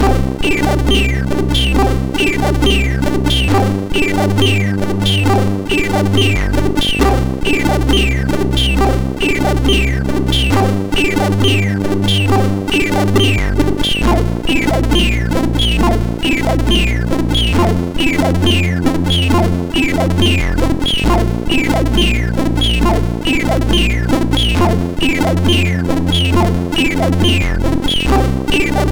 Old Music, MOS6581R2

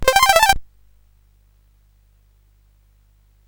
sid CSG8580R5, GAMES; Card Sharks

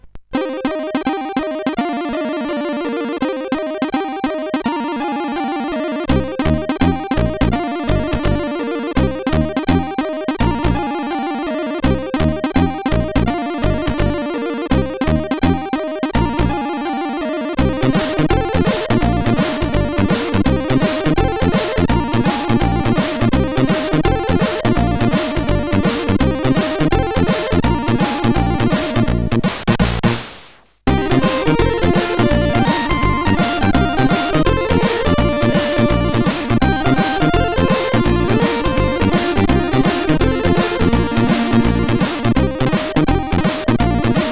Whisteling, CSG8580R5

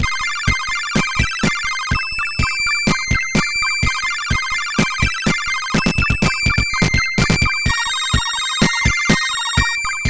MOS6581R2

